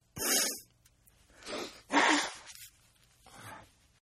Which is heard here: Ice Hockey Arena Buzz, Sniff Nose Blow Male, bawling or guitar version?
Sniff Nose Blow Male